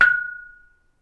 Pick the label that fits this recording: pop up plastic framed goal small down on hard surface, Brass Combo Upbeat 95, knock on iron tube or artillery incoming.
knock on iron tube